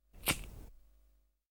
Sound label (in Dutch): Aansteker; KMP Film Video Sound Effects